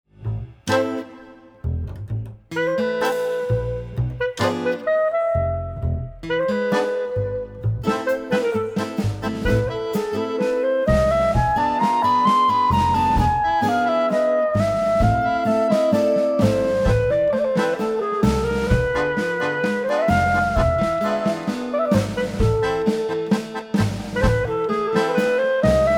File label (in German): Klarinette